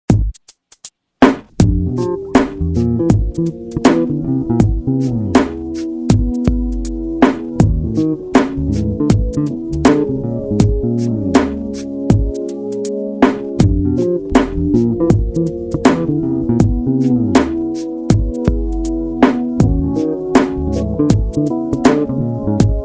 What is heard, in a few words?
Rhythm & Bass